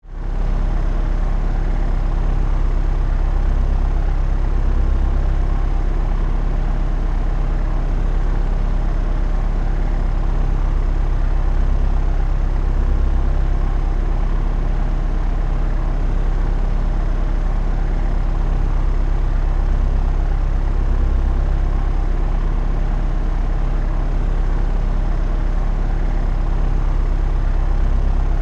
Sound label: Airplane In Flight, Transportation, Aircraft, Airplane, In, Flight, Propellers, Cockpit, Perspective